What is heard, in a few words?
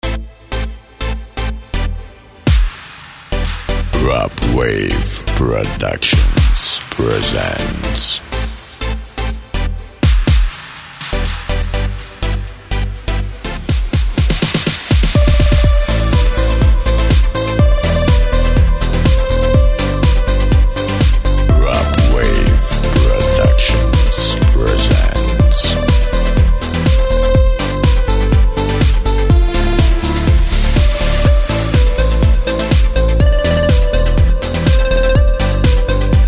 Dance Pop Instrumental